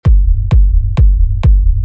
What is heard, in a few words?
LOW END